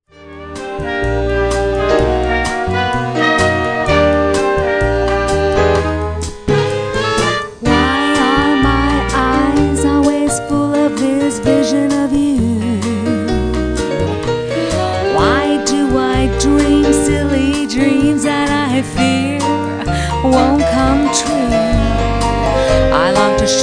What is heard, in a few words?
vocal, big band